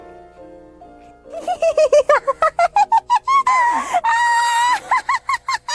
cat laugh; miss giggles

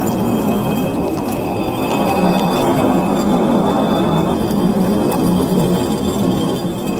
concrete scraping

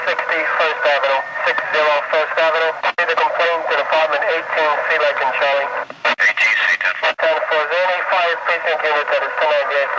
police radio

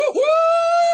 woot woot

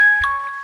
notification phone; alice in borderland

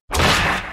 savage blow sfx; battle cats

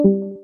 discord leave louder fixed delay

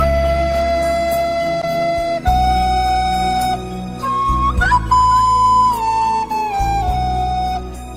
shitty flute

